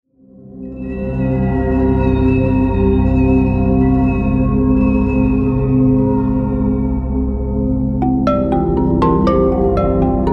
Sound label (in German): Trailermusik